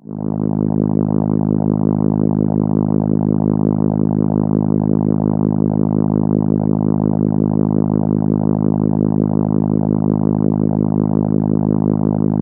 Contra G#3, ARP Solina

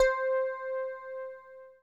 FM bass C6; Greytsounds; Scott Peers Sound Engineering

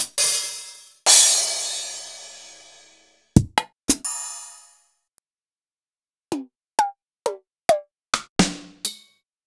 Akai MPC3000

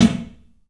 CLUB SNARE; 3D Sound; 3D Drums

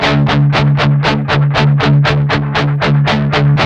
8TH D#3, Guitars; Spectrasonics; Hans Zimmer Guitars VOL-1; 128-JACKHAMR

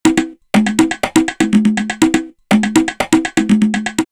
Instant Zen, drumloops DrumMachines; X-Static Goldmine

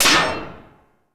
Hull Door; Total Drum&Bass; 90 s Sample CDs; Hits & Bits

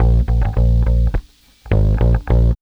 BASS, Funky Ass Loops; East West

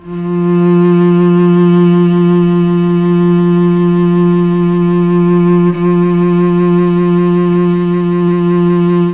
F2 CELLO, Mellotron; CHMBLN CELLO; Mike Pinder - Mellotron Archive CD

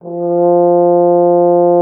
FR HORN F3; Xsample VOL-3

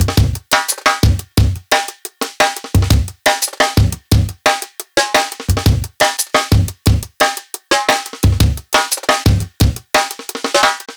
Live Jungle Drum Break, 175; JungleBreaks24

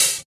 Open Hhat, Hihat; Equipped Music; Loungin House

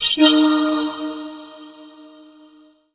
VOICE FX, Dance Vocals

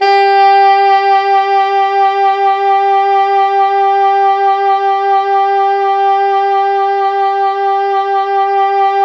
Pop Brass; Best Service ProSamples vol.19; AKAI

